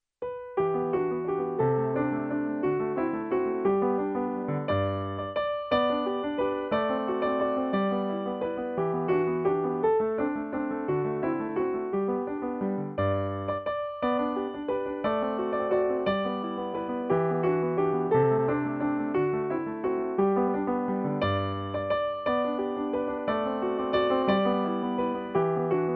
O galo PIANO

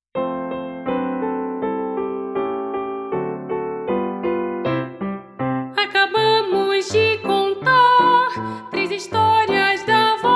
VOZ GUIA

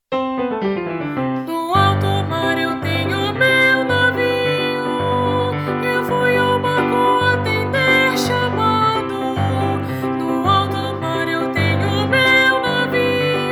VOZ; 15 canções capixabas

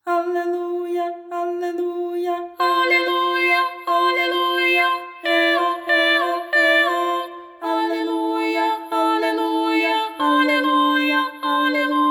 Aleluia a duas vozes

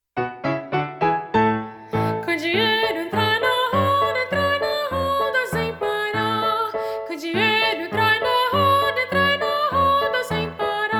VOZ; Candieiro; 15 canções capixabas

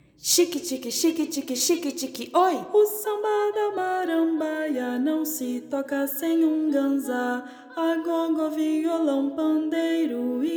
VOZ GUIA; O Pequeno Cancioneiro

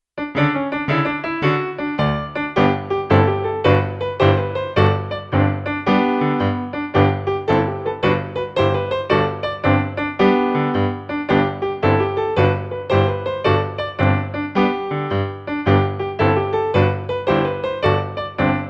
PIANO; Bambalalão

